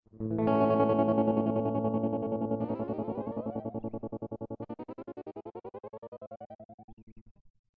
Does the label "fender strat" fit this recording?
Yes